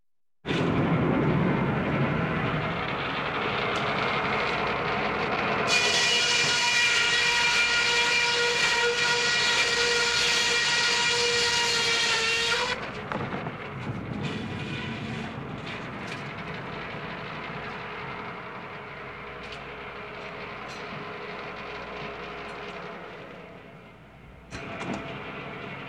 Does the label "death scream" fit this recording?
No